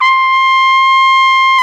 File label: Solo Brass, Tpt p, AKG